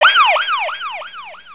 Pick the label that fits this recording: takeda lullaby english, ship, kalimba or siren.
siren